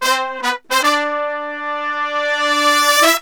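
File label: brass 110 A minor, brass murga; Best Service ProSamples vol.14; World Grooves; AKAI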